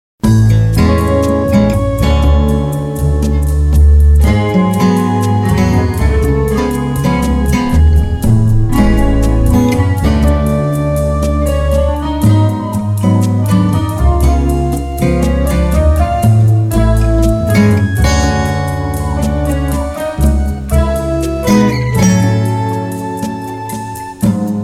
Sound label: Slow Bossa